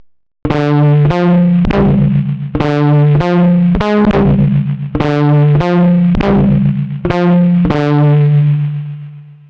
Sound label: Distorted, electric guitar